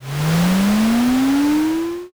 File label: airplane